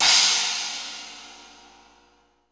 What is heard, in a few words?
SPLASH, CYMBAL; drum